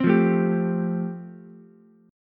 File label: F Major Add 9th, Ukulele C6; Fadd9 strummed; JGuitar